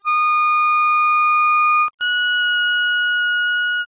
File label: clarinet; Spectrum Interpolation Synthesis for the Compression of Musical Signals